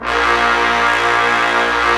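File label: Brass Ensemble mute; Roland L-CD702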